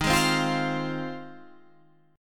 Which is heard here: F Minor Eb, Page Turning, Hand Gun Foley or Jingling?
F Minor Eb